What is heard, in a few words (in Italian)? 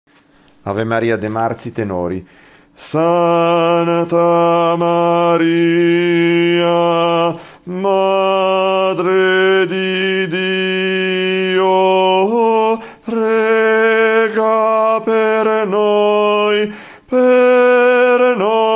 tenori; Ave Maria De Marzi